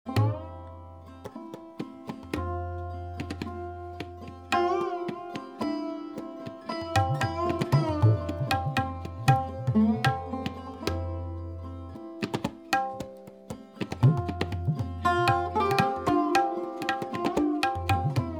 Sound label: Gat in Jhaptal Theme with Tabla accompaniment in rhythmic cycle of 10 beat; Ken Zuckerman